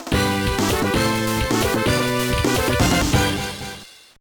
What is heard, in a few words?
Jingle; duel masters 2 invincible advance 2004 gba